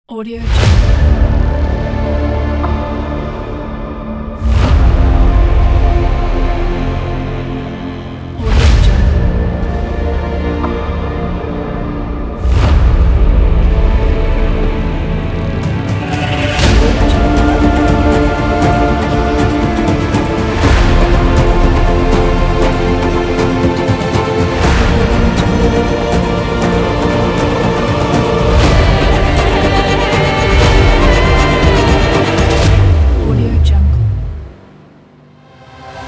epicness

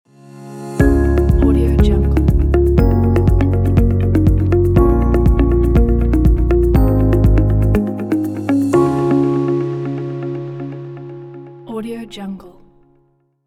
corporate logo

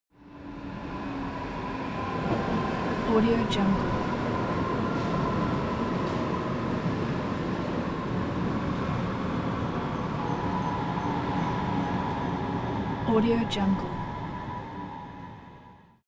transportation train underground station arrive

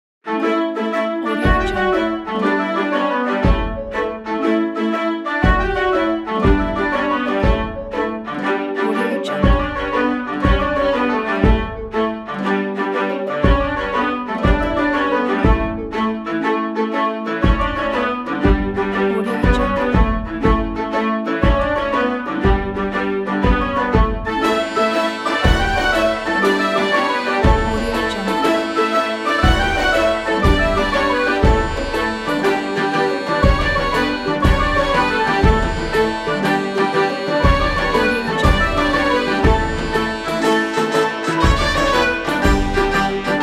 funny tavern song

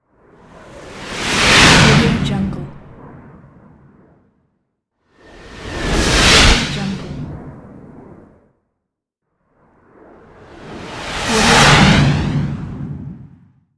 missile fly by

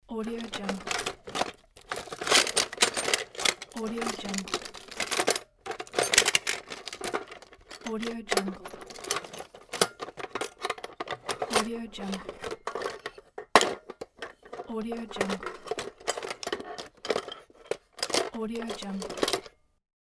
toolbox search

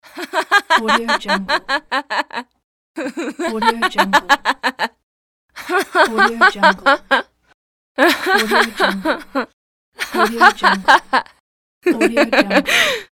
woman merry laughter pack; medusamusiclab

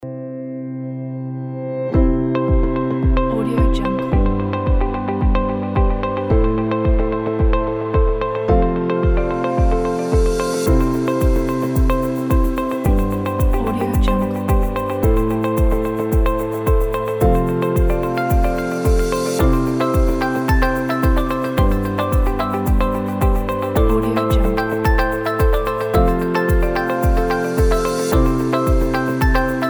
light ambient corporate

